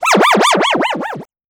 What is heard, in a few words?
Scratch Alien